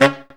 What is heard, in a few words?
Brass Stab; CMI